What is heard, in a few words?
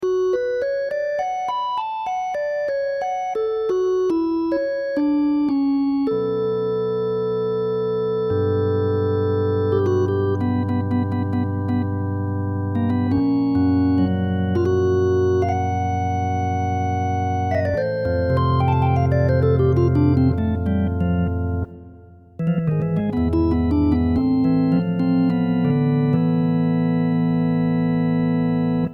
the b electric organ; electrickeys